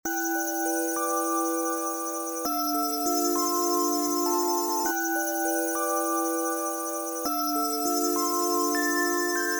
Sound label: 100bpm Tonal Oneshot; Flowers; NEO Explorer